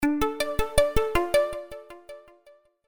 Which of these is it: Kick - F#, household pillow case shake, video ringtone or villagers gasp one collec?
video ringtone